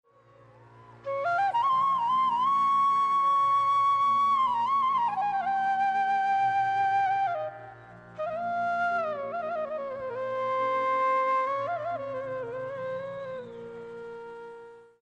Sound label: Bansuri